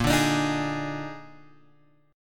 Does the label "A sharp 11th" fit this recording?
Yes